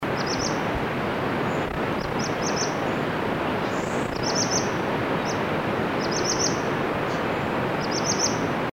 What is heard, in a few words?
Gray chinned Minivet, birdcall